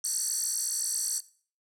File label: alarm; SFX Daruma